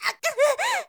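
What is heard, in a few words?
woman damage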